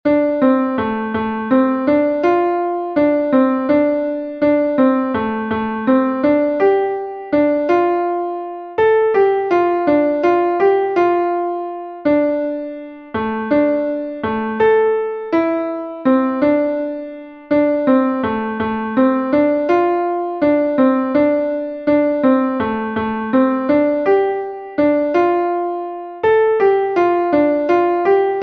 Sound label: folk